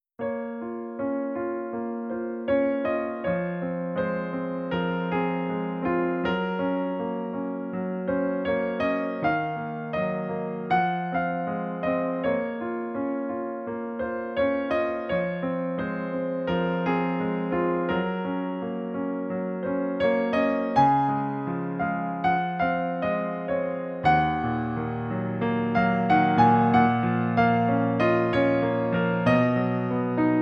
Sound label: modulation